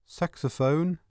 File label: a saxoph; CD Action 18b 1997